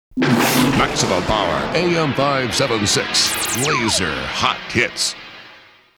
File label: jingle maximum power